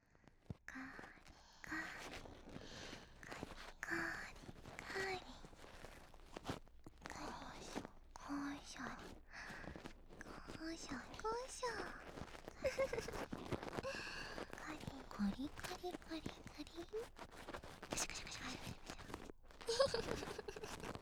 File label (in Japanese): 耳かき; 2人から耳かき